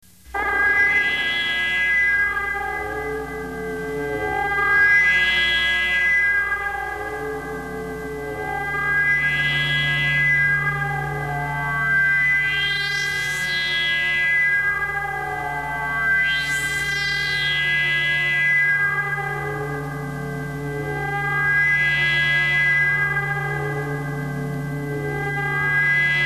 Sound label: Space Wobble; Halloween; Hallmark Halloween 1989